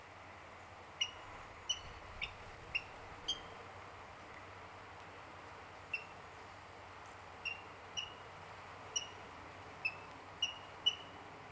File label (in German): Wasserralle; Iller bei Seifen OA; Johannes Honold